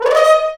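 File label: french horns rips; Roland L-CDX-03 Disk 2; F.Horns FX+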